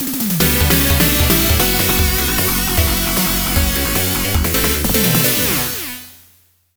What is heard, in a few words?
Victory, PC-98; Valkyrie the Power Beauties